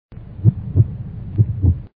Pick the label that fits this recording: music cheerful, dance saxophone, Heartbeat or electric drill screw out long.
Heartbeat